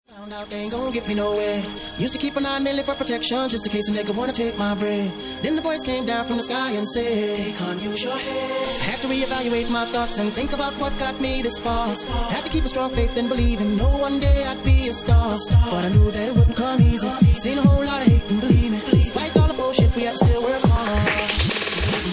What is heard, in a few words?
Bassline Mix